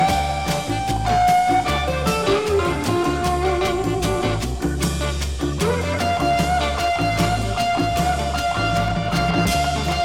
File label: guitar